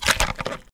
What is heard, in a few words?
gut CRNCH